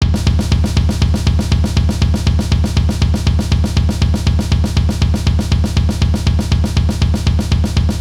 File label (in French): Break